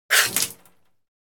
Human spitting spit water into sink

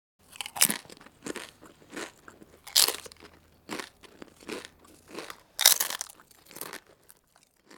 Eating potato chips mouth closed crunchy

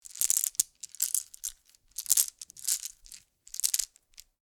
Game tokens moving clattering in hands

